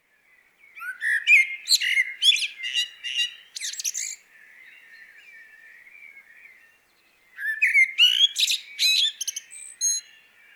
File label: Juodasis Strazdas Common Blackbird Turdus merula